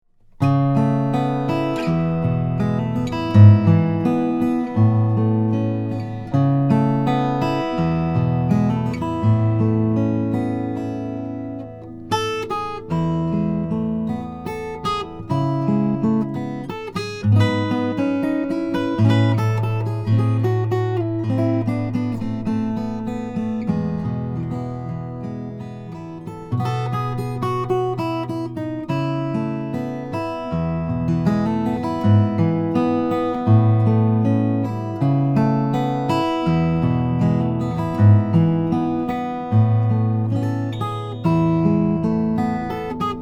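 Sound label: Olson SJ Indian rosewood acoustic guitar; 1993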